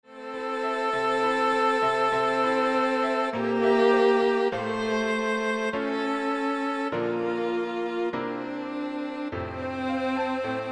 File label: Piano Trio